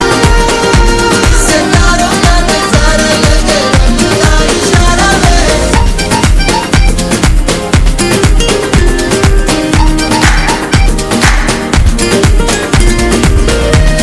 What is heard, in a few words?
pakistani song ringtone